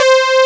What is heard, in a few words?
SAW,sound canvas